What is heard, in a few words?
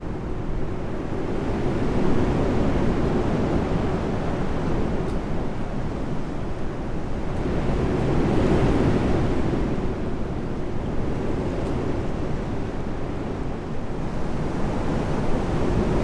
weather wind strong trees; sound effects one